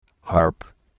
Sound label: harp